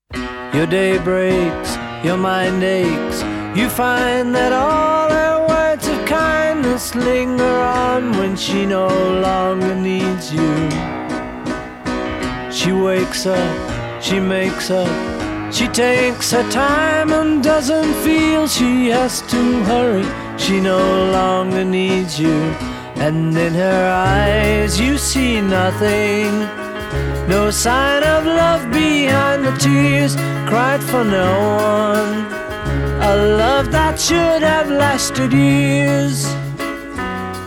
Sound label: clavichord